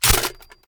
ar drum reload empty magout; weapons; akilo47; viper

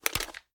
inspect drum rotate; reloads; gmod

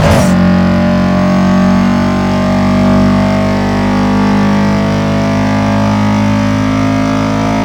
third, vehicles

